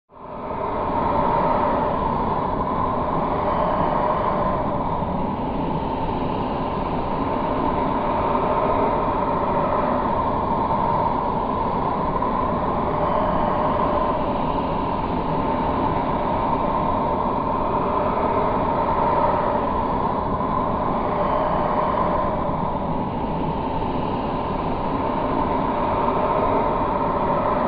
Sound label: Growl Ambiance, horror